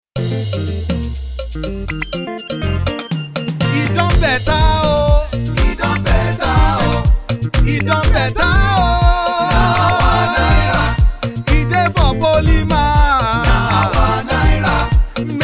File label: Theme Jingle; CBN; Polymer Notes